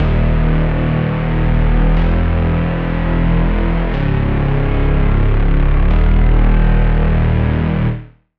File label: Reese